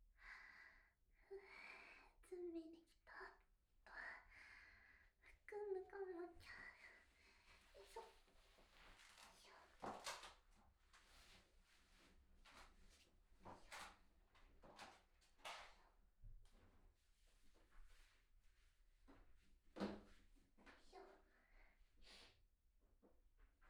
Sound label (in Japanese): マイク入れておしっこ, ASMR